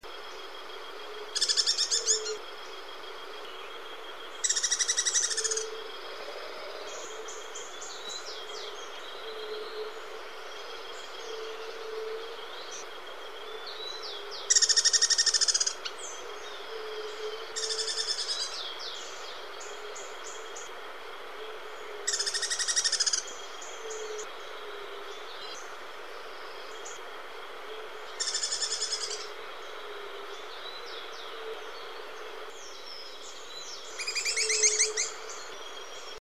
Leptopogon amaurocephalus

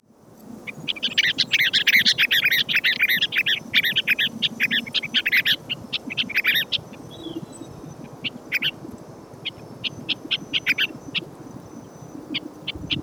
Fluvicola nengeta

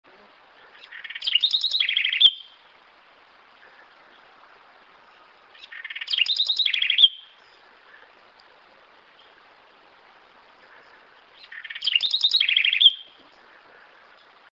Troglodytes musculus

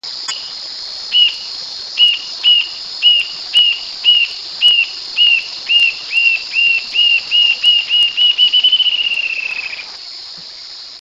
Galbula ruficauda

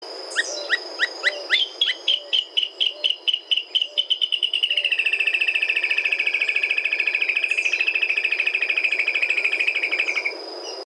Laterallus viridis

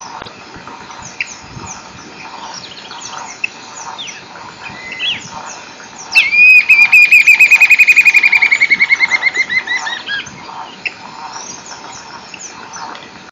Lepidocolaptes angustirostris

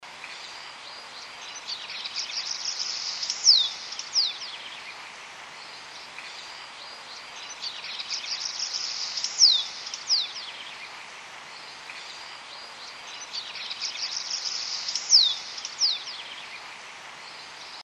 Pygochelidon cyanoleuca

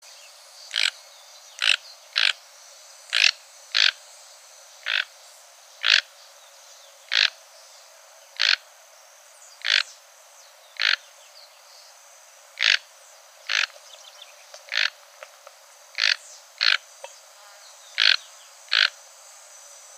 Ramphastos toco

